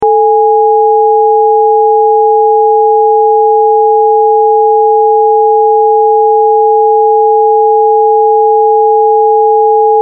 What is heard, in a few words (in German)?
Rein Septime